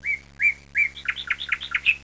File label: nightengale